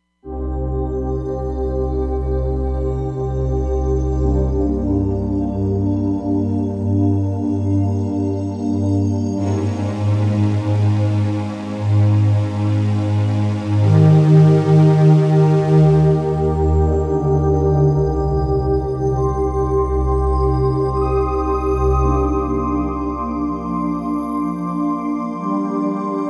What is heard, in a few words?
Roland Fantom XR; Combination